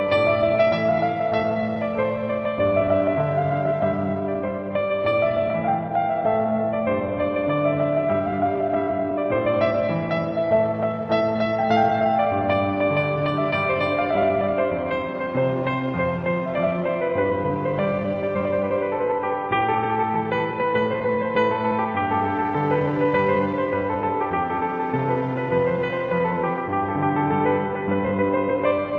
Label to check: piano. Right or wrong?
Right